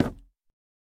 錾制书架 破坏声

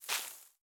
大型垂滴叶向上倾斜声

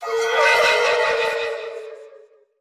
空手待机声; 悦灵

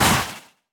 受伤声; 旋风人

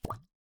状态栏界面状态栏气泡声; ambient

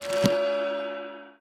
附魔台附魔声

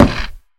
嘎枝之心击打声

